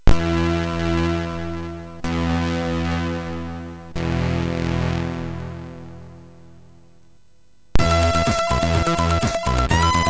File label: sid MOS6581R3